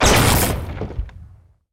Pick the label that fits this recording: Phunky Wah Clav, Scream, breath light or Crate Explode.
Crate Explode